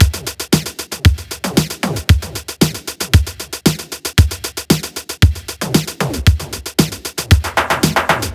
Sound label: 115 Beat Lo-Tech; Beats; Zero-G; Future Wave